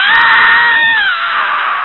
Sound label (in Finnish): huuto